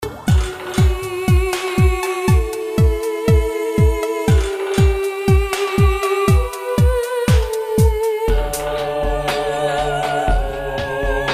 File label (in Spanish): tono chinesky real